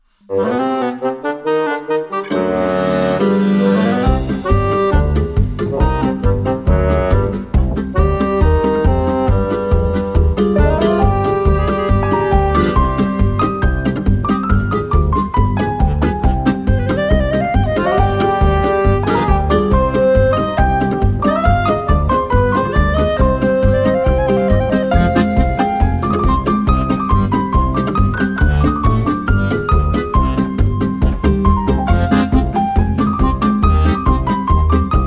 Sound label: Woodwind